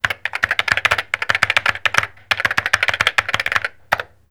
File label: enter code typing; Call of Duty Modern Warfare